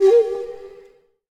spinButton; templeofnudges

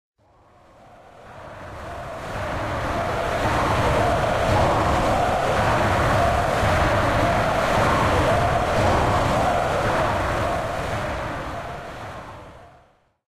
crowd chant Portugal; football

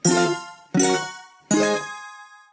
freespin reel climb 1 tri up; willyshotchillies

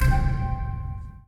wild landed; wingsofriches

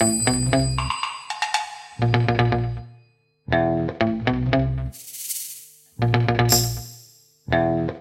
safe Mode Loop Music; wild wild west

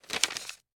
multiplier Unfold; reef raider

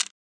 marker place, roulette; advanced-roulette

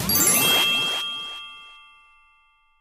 multiplier And Freespins Left Count Up; warlords

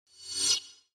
Butter Fly Summon; jungle spirit

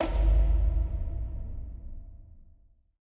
nudge loop end; rise of maya

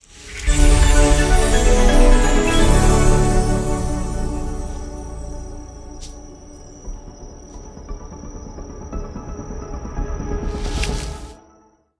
intro Free Spin; fairyhansel

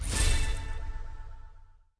Continue; colossal pinatas

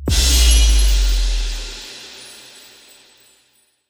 big win; silverback

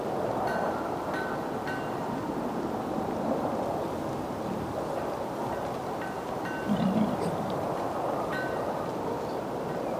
splash Free Spin; warlords

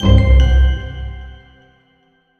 small Win Tonal C; fairymirror

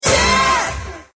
double Up Win Sound; jackpot 6000

